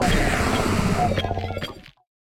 Grito de Ferropúas; Gritos de Pokémon de la novena generación

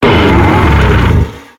Grito de Haxorus

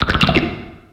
Grito; Roggenrola

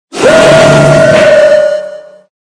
Grito; Zamazenta

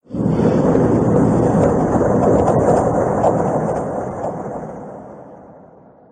Grito; Coalossal Gigamax

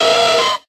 Grito de Electrike

